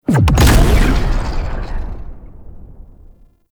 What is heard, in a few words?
Cloak Remove; Warframe; Frumentarius